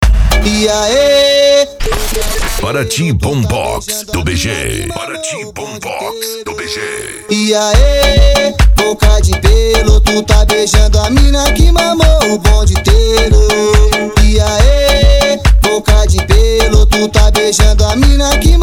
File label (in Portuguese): bombox funk